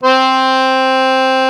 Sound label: BANDONEON C2; Best Service; Alpine Volksmusik